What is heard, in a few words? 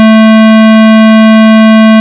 tone